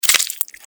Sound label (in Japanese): クリーチャーが食べる音